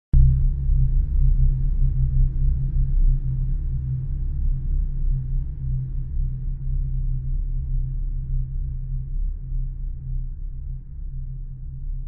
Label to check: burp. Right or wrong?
Wrong